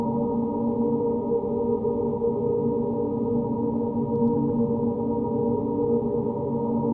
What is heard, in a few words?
Ambiance; Knytt